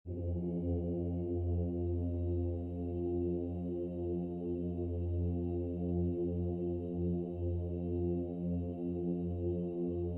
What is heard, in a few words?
Hallway Ambience; My Friendly Neighborhood